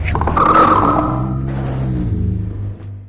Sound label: hydra search; hl2